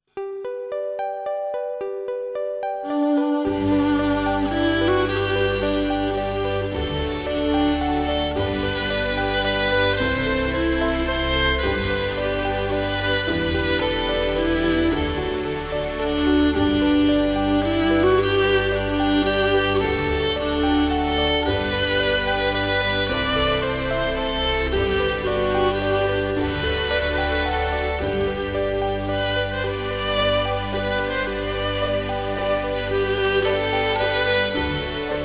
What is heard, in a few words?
Violin